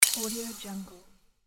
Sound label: amazing magic